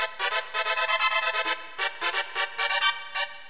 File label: devil bg keysound; bms charting